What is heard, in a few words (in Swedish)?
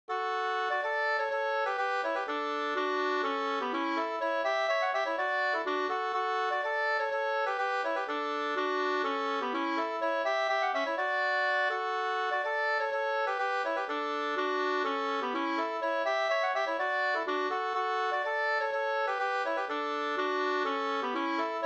hamburska; Sodertalje